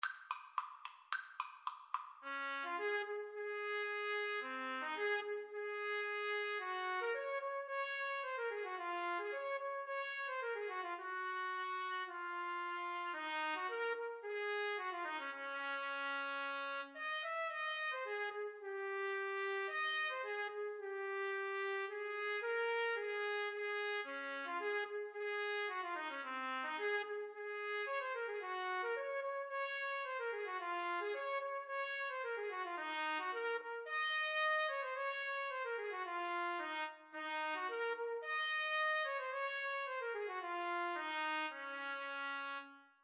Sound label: trumpet duet